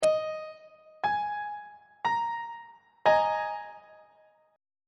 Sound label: g sharp suspended 2nd 2nd inversion on treble clef